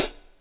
Real Closed Hats

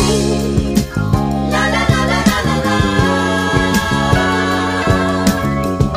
full lala lala la la laaaaaaaa, Loops Phonky

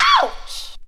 ouch; Voice

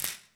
whip clap

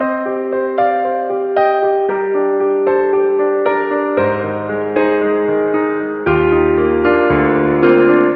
piano, Loops, Sad

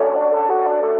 knee flap; Loops; Electronic

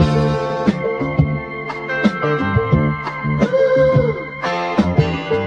full of vocal ooh, Loops, Phonky

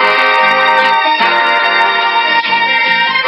horns, jazz loops

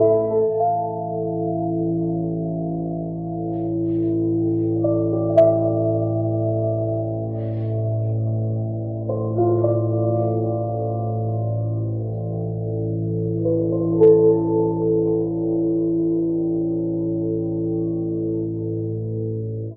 paino, Sad

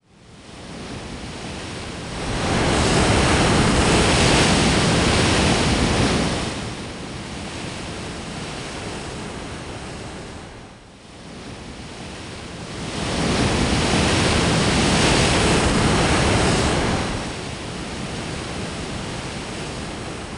waves; Rumbles

